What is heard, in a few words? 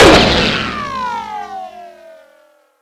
armor rico; gmod; lvs framework